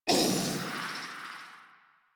Pain, Barks, Battle; Warframe; Zealoid Prelate

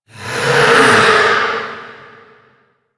Rell Specter Vocal Attack; Enemies; Warframe; Priest Quest

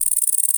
Ext Insect Close, Ambience

